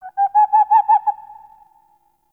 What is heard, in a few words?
Forest Owl; Warframe; Grineer